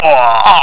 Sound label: DIE; pang